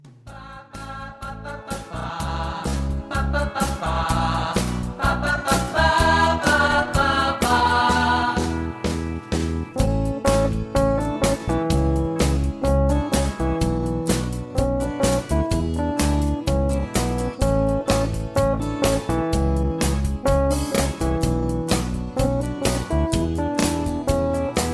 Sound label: High Key